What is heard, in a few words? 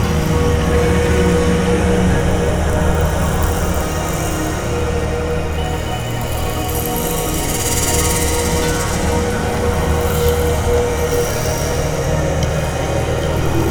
Synvoxperc Scape